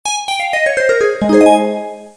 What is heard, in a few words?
success; The Land Before Time Activity Center (Sound Source Interactive) (1998); maze